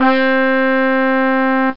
brass; OctaMED SoundStudio V1; jazzies samples

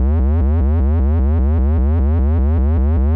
warn; StarSiege

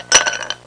ice cubes in glass; Household

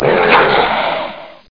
GARG ROAM

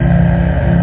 fair vox, rave

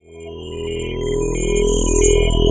hacking pass effect part; Earth and Beyond; EA Games

